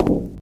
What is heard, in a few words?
glass, footsteps, walk; source engine; left 4 dead 2